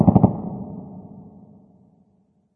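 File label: distant machinegun; random amb sfx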